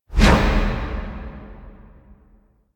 auto swing; smite; poseidon

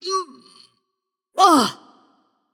nv grunt lift; smite; voicepack; morgan le fay

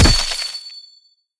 hit; smite; god sobek

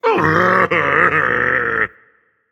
boohoo, dragon; fafnir

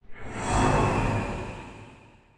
nightmare glide; Smite; Thanatos

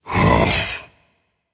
sigh rage; chaac

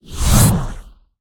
coffin pull; Smite; Baron Samedi

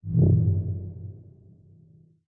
synth; smite; chronos

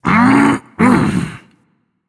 clear throat; smite; voicepack; guanyu

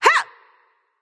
grunt attack; smite; nemesis

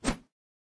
lobby victory swish; smite; kukulkan

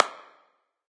clap; smite; hades

